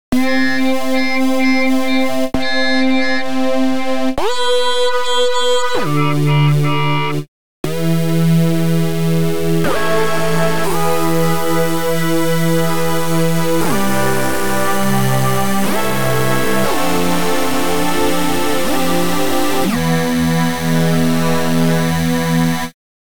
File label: synth pressure